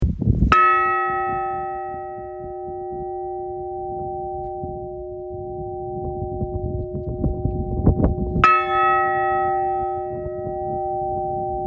bjarnaneskirkja likhringing, kirkjuklukkur

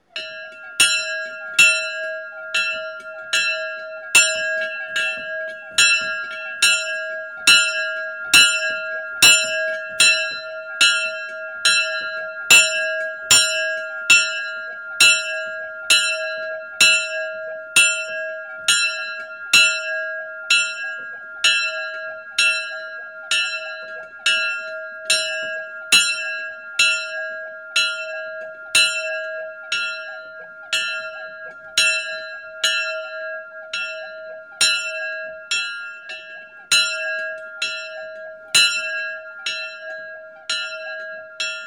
klyppsstadakirkja, kirkjuklukkur

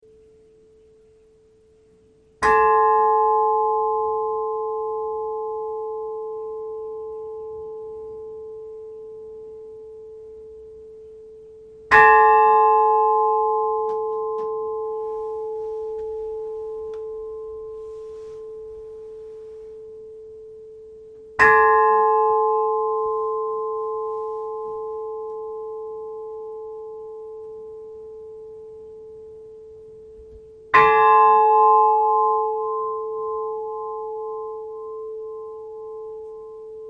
helgafellskirkja likhringing inni, kirkjuklukkur

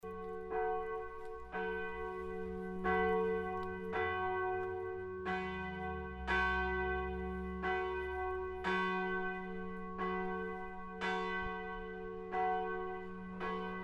hateigskirkja hatidarhringing, kirkjuklukkur

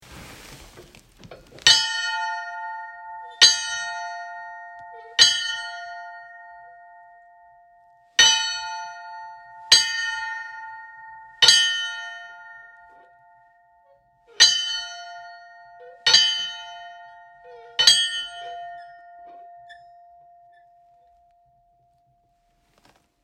hofskirkja oraefum badar, kirkjuklukkur; sudurprofastsdaemi

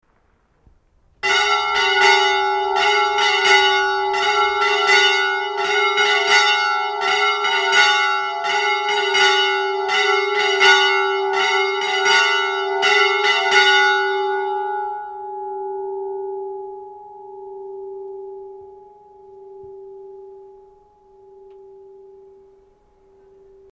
grundarkirkja badar, Kirkjuklukkur; eyjafjardar og thingeyrarprofastsdaemi

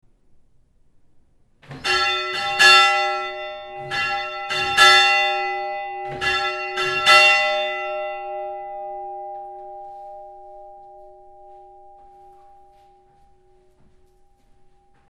thingeyrakirkja stora 3x3, kirkjuklukkur; hunavatns-og-skagafjardarprofastsdaemi

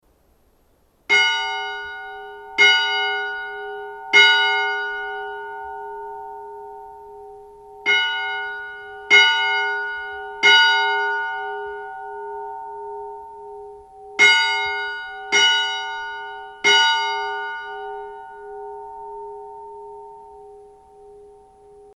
grundarkirkja minni 3x3, Kirkjuklukkur; eyjafjardar og thingeyrarprofastsdaemi

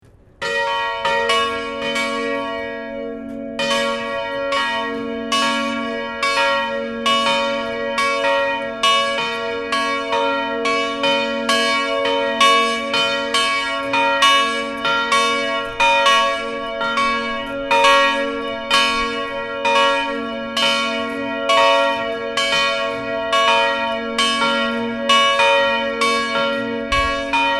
kopavogskirkja lok messu, kirkjuklukkur

